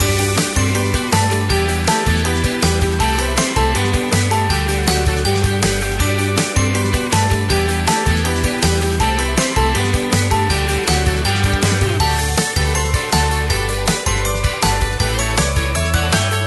Music Game; Christmas Adventure of Rocket Penguin; wii u

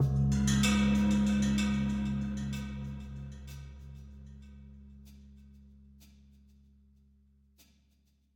Cave PERC; Minotaur

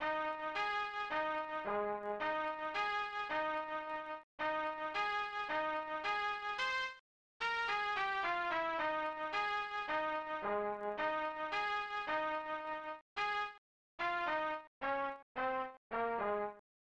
Unused Jingle; Ren Stimpy Buckeroo; snes

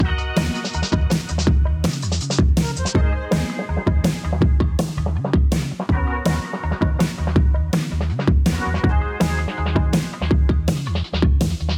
DnB Test Song no crash; hot lava; ios macos windows

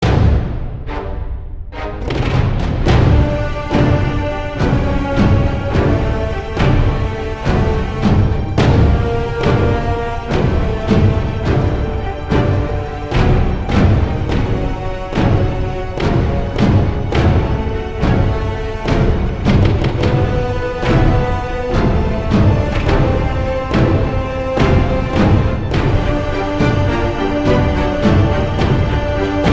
music Underground Battle

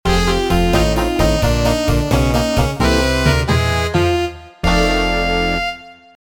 Game Over; Clockwork Knight 2; saturn

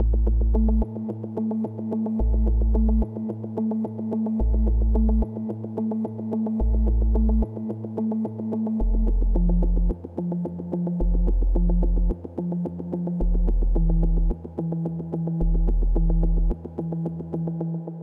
chords; one dog story

